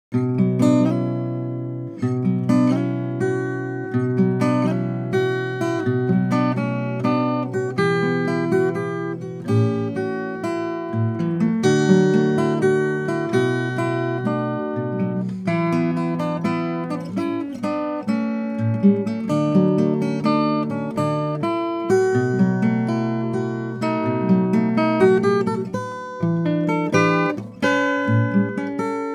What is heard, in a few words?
Dry